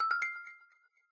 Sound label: trends updated; TWBlue